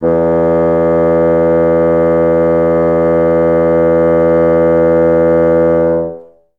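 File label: bassoons sustain E1; Best Service; Peter Siedlaczek's Advanced Orchestra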